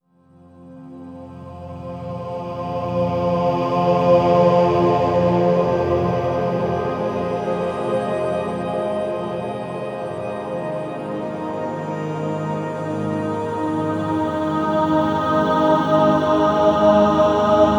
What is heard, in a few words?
Low Complex Voice, Complex Wave